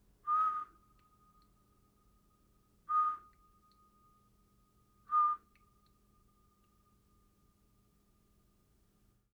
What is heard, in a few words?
Symp Whistle